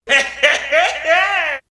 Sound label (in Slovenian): SMEH